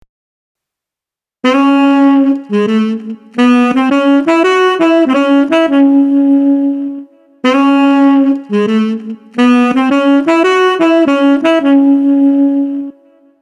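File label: Street Tenor Sax Relaxed, 85 bpm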